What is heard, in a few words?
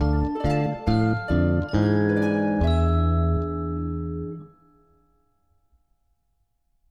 Lose; Soraya